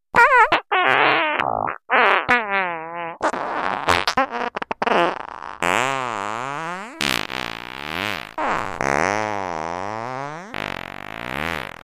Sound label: Fart